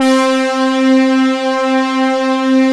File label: BRASS, PADS; Masterbits; Vintage Voltage